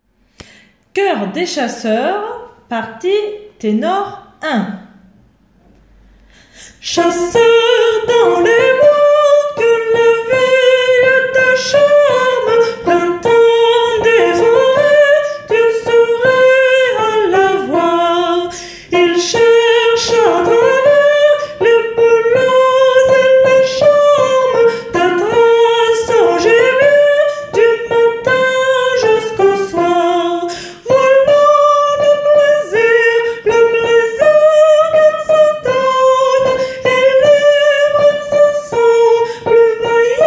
CDC ténor

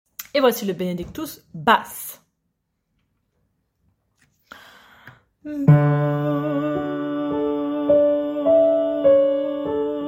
Basse

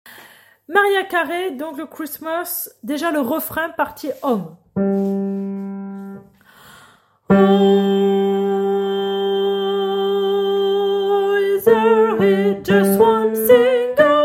Hommes refrain

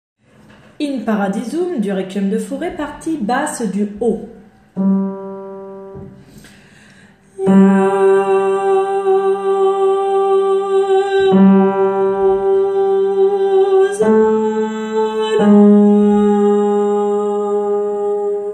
Basse haut; In Paradisum

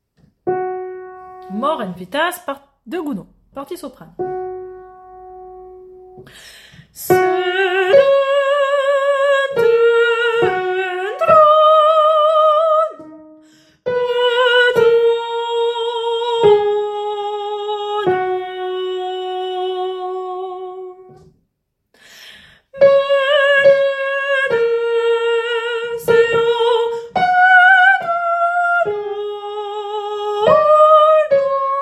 partie soprano hybride; Mors et vita

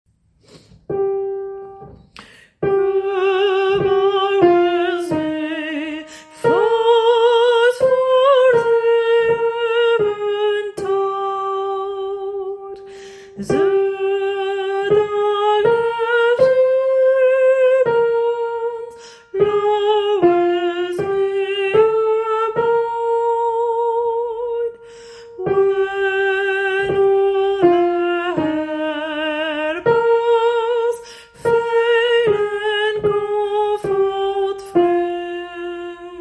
partie soprano

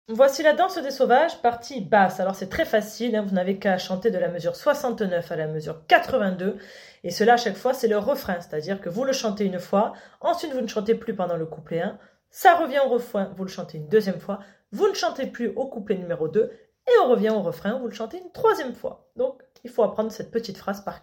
Basse

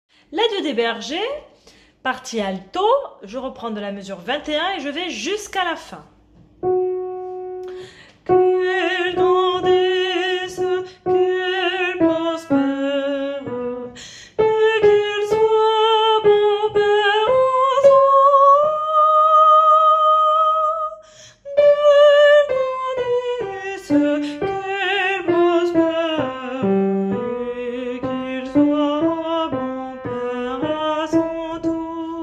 partie soprano

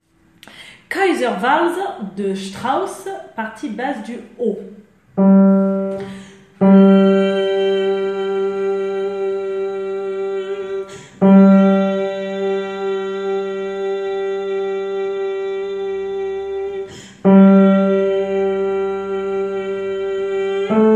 basse haut; Strauss

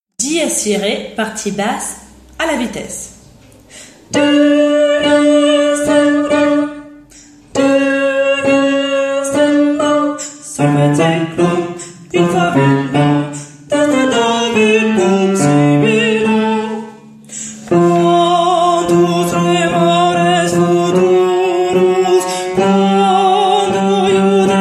Requiem Dies Irae Basse; Requiem 31; Mozart

